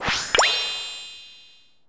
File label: MAP CHARA CUTIN; maimai